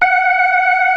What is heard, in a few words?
ORGAN, NO PERC